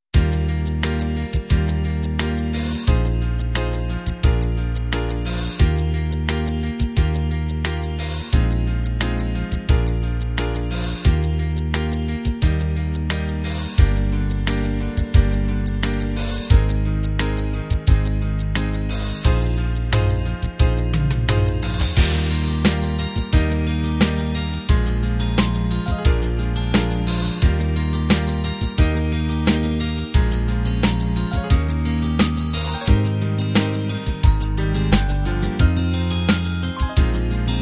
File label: cinema ballad